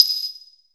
little bell; Misc Percussion

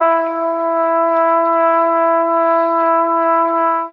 prolonged, Horns

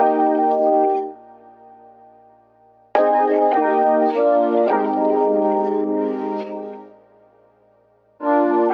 keys juicy keys; Loops; Phonky

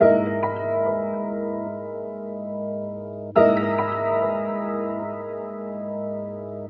piano sudden change, sad; Loops

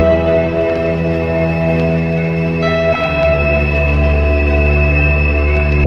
keys, sad loops

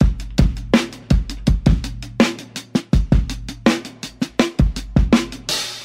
breaks a lot, Boombap, Drum loops

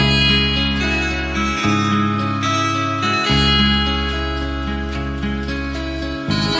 guitar

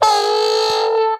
baby horn

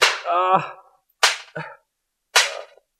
spanking a guy

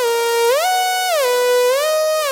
jee funk, Loops Electronic

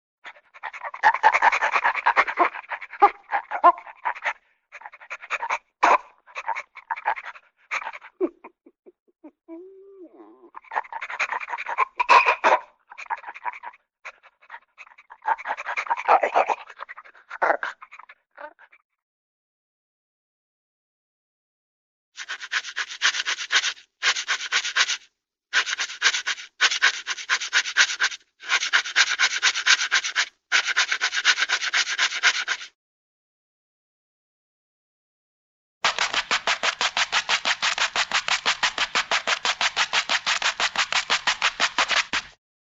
dog scratching; Animals

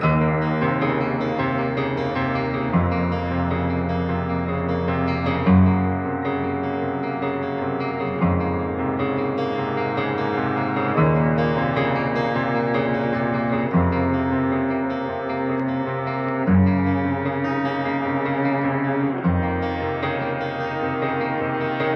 guitar and strings, Sad; Loops

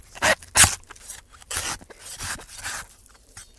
bone break cutting flesh

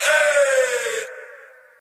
ay group of men, voice ooh ahh

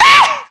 scream

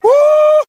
"woo"

